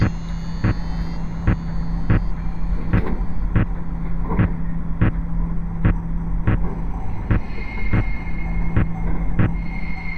native british birdsong

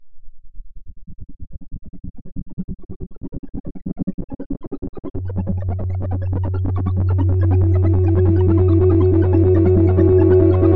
fake guitars

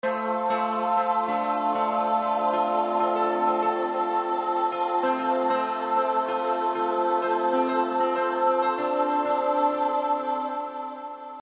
piano

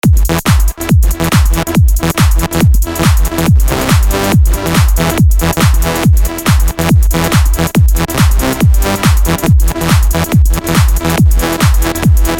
house music

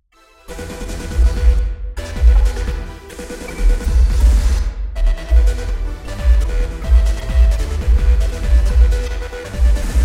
sandstorm

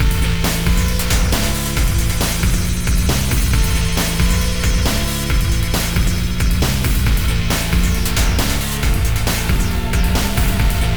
Hard Beat; msx; Essential Sound

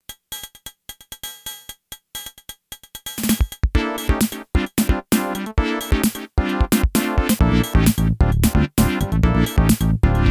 metal theme (adlib)

